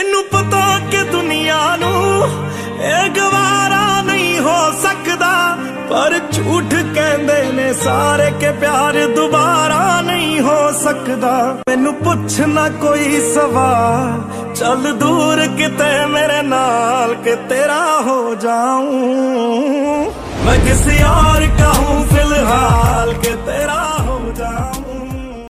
new male version sad love ringtone, bollywood ringtones